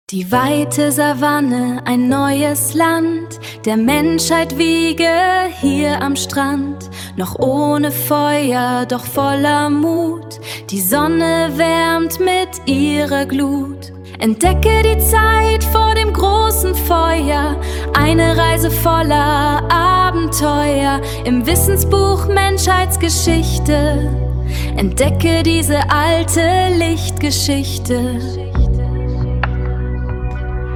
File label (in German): Steinzeit vor dem Feuer Musik